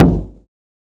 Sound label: Perc